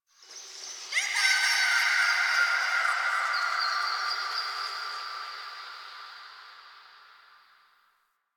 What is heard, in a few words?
Park Memory Echoes; Warframe; Quests; TheNewWar; Ambience; Zariman